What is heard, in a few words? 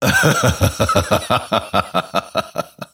grimstroke laugh; dota